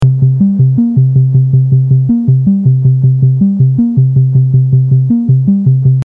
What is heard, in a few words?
ms20 clean